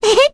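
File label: Laugh, Korean; Requina; kingsraid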